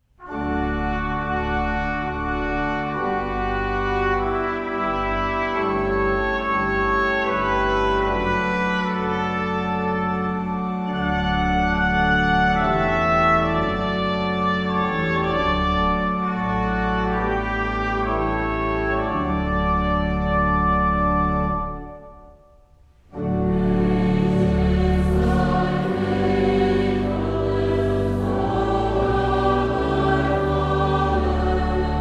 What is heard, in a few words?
Hymn